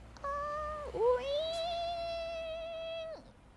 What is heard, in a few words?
car window down noises